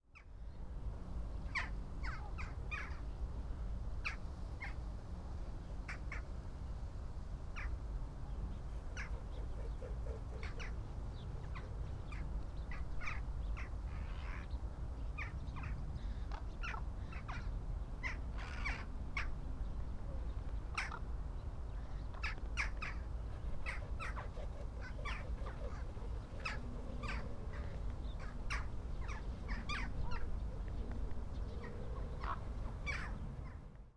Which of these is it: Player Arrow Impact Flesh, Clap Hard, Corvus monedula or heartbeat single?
Corvus monedula